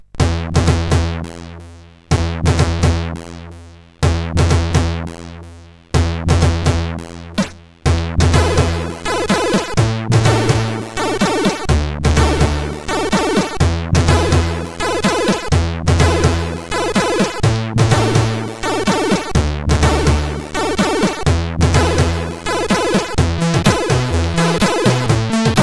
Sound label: Tune, sid CSG8580R5